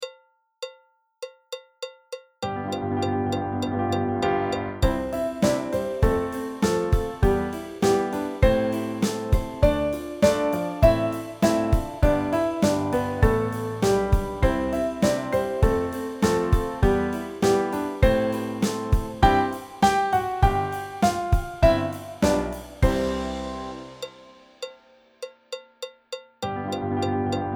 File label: arkansas traveller Backing Track; folk song